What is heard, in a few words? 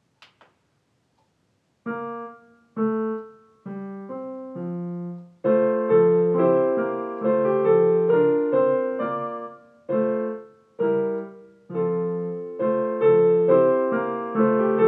presto in f; van G P Telemann